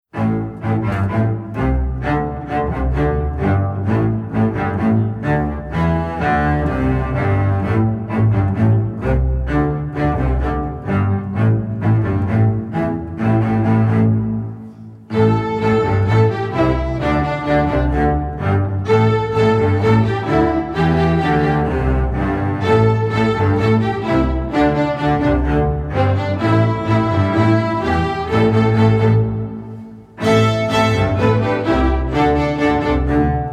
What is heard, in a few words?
aeolian rock